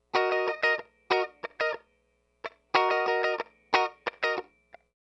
091 Chill Pop Guitar Rhythm Ebmin, Chill Electric Guitar; Pop Rock; Pro Tools Essential Loops; Digidesign